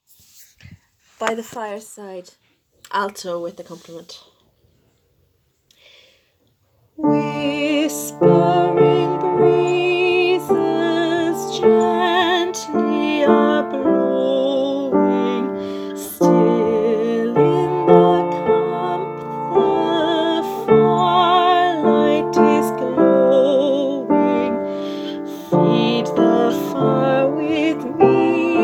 alto and piano

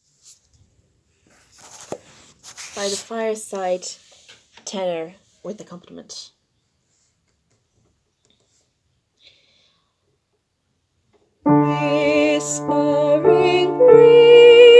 tenor and piano

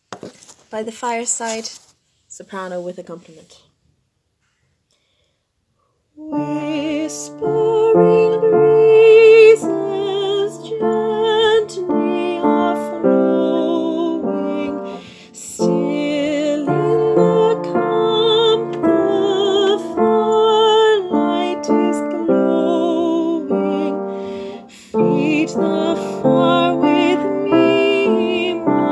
soprano and piano

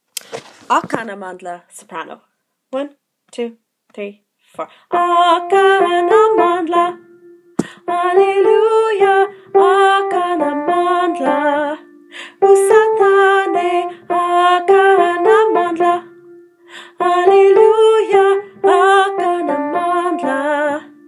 Soprano

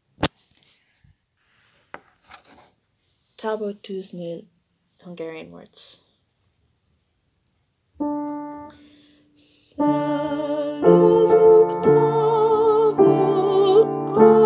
performance recording Hungarian; Tabortuznel

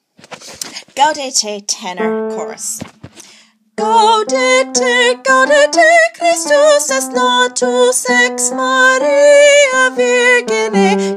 tenor chorus; Gaudete

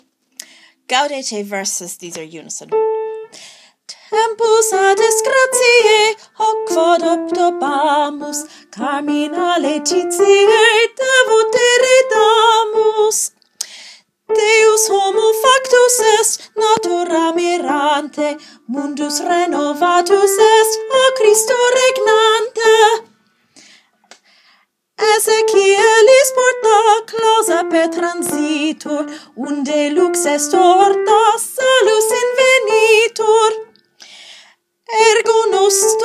verses unison; Gaudete

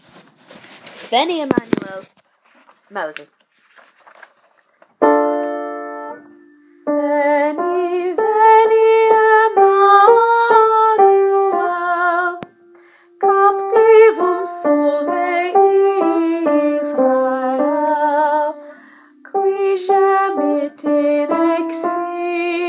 Veni Emmanuel Melody

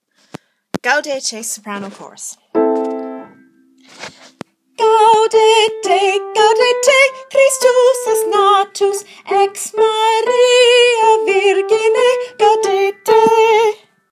Soprano chorus; Gaudete

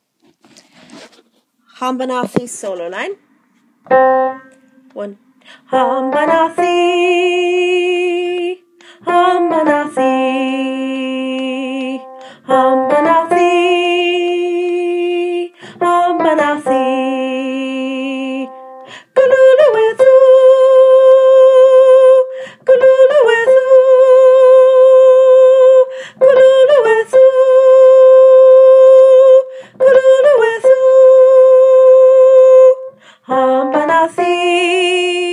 Hambanathi Solo, choir

